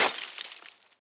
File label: ice break; teardown ps5 windows xbox series xs gamerip 2022